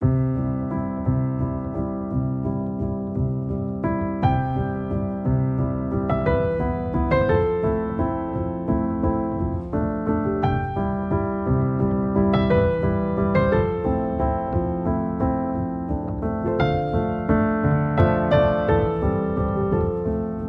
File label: wedding background music